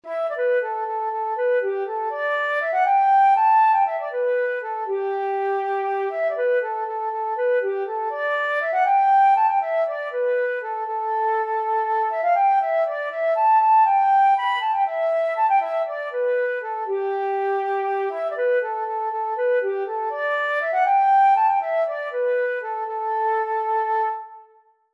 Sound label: america lies far away flute